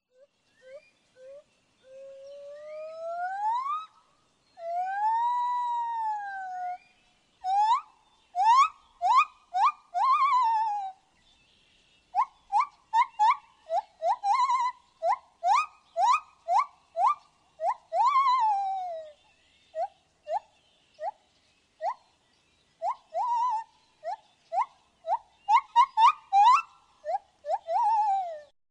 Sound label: MONKEY WHOOPS WHISTLELIKE; wildlife sounds